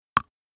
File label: scale